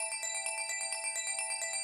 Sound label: Music Box Reel Spin; Showtime POG